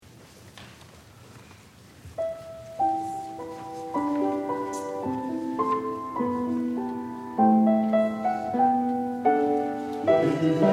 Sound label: Choir Anthem during Offering Collection; First Trinity Lutheran Church